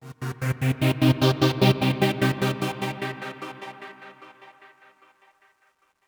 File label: Oneshot Synth